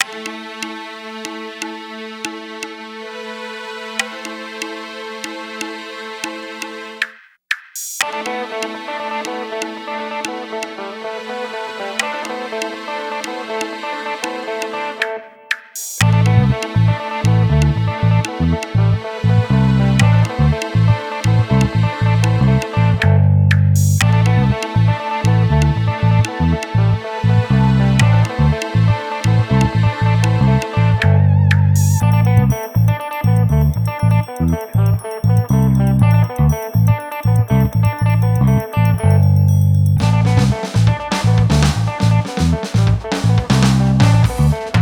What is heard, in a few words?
sidewalk loop jam